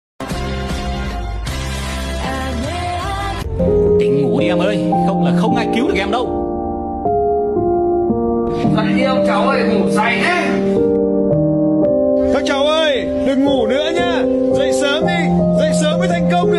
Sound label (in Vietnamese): Bao Thuc